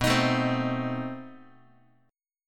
B 7th Flat 9th, down, Guitar; B7b9 strummed; JGuitar

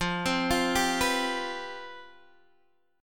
C Major 7th Suspended 4th, F, guitar chord

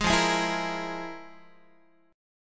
F Minor Major 7th Double Flat 5th, Guitar; FmM7bb5 strummed; JGuitar

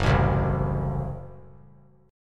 F sharp Diminished; F#dim strummed

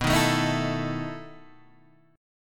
B 11th B Guitar DADGAD, chord

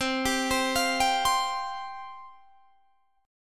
C Suspended 4th C, Guitar, DADGAD

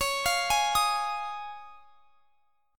Db Major Add 9th; arpeggiated

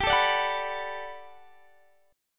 B Minor 7th G, chord; Bm7/G strummed

